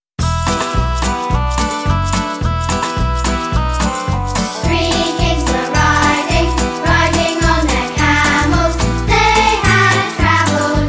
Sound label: Three Kings Were Riding Vocal